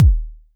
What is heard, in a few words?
BD; TRX